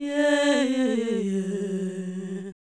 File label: Fem Vocal 128bpm G FX, African Voices